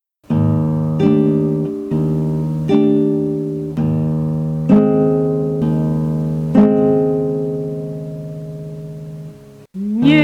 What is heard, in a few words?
gitaroj